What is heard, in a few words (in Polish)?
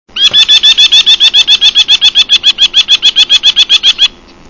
drzemlik, głosy